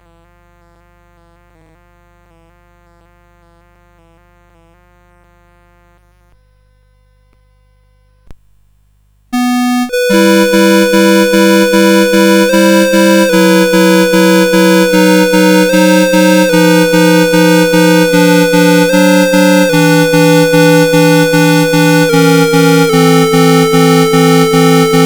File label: Prelude in E Minor, CSG8580R5